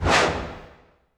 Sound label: cinematic impact wipe